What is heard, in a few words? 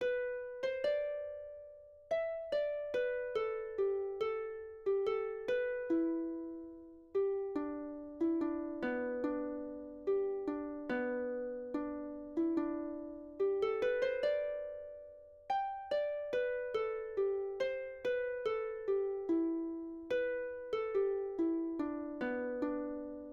melody; dunlop; i would not tell